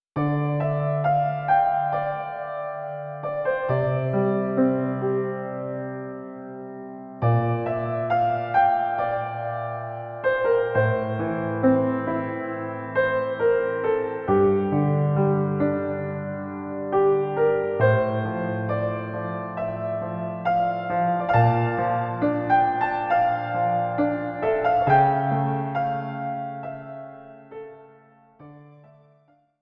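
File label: background music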